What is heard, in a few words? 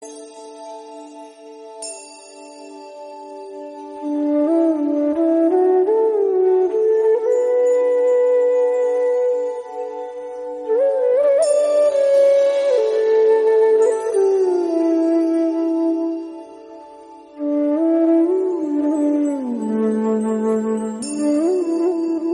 enchanting flute bgm; krishna